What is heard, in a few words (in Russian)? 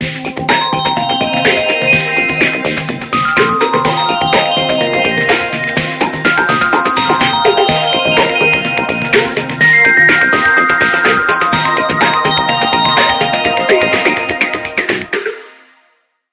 Budilnik klass